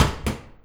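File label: DOUBLE HIT, household; AKAI Anime Sound FX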